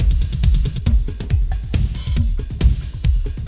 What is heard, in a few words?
Clubbin' 138